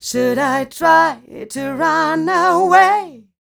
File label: f mel d flat, fem Vocals